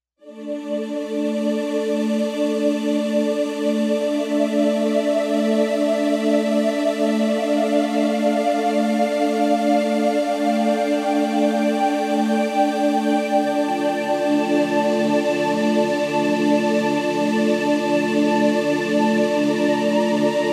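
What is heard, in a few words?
Voice COLD BREATH, synth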